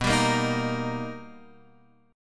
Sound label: B Augmented Major 7th B Guitar Open F; B+M7; strummed; x,6,7,5,6,5; JGuitar